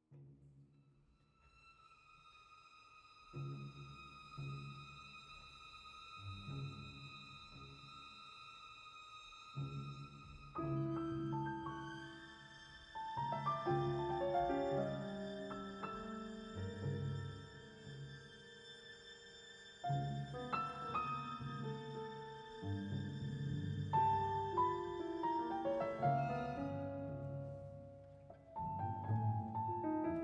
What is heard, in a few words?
pianoconcert